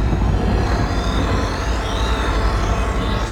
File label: plasma cannon hum, ships combat; CosmicRage; CosmicRageSounds